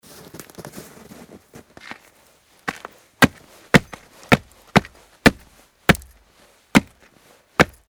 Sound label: steps in snow